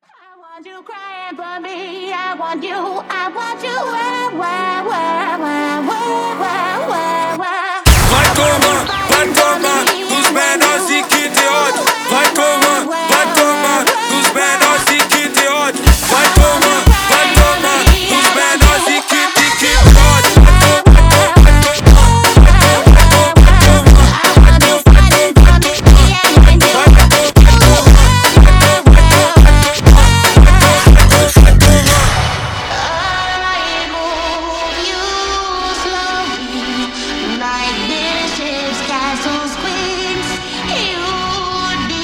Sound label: wa wa wa funk